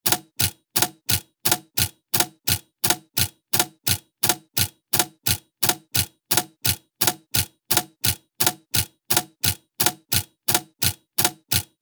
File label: Steam engine metallic ticking mechanical loop